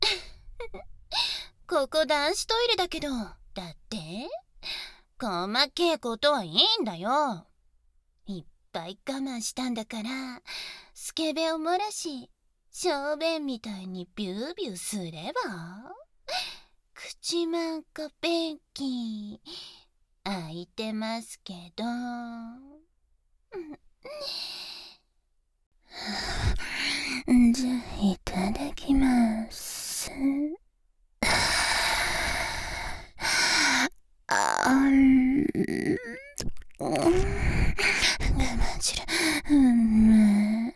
ひょっとこバキュームフェラ 左 語り掛け, ASMR; 手マンコとひょっとこバキュームフェラ; ギャルフェラッ!!学校は援交ギャル風俗店ッ!!

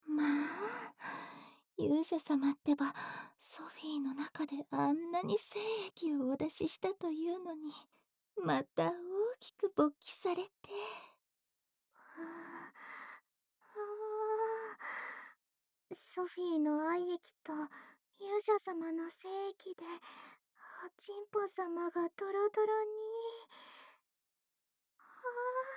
耳舐めカウントダウン_SE無; エミィのロイヤルヴァージン♪

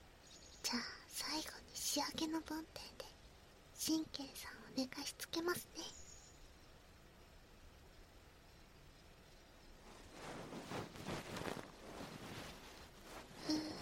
【左】梵天, 耳かき

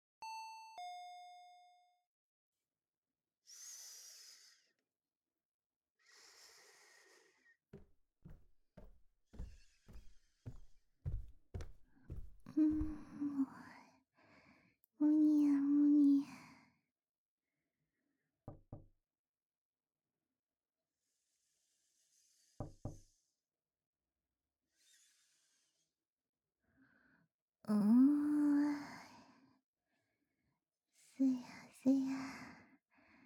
ディープキス, ASMR; 愛の強制指導…？; 強制指導!～引きこもり生徒は愛の絶頂部屋で満点イキ～; OPM SHORT

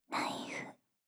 ナイフ, ASMR; シャッフル睡眠ランダム再生用; 無声音ASMR; シャッフル睡眠法で眠ろう; バイノーラル音声

